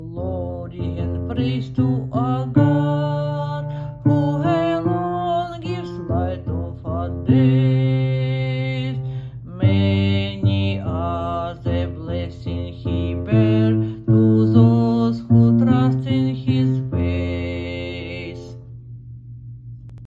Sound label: Glory and Praise BASSO